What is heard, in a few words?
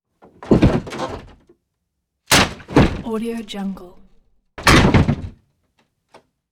stage flat impacts wood drops; prosoundfx